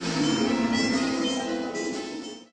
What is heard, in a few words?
haunted music